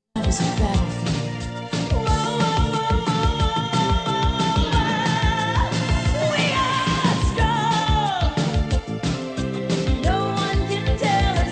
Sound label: AKAI HX W351A, double cassette deck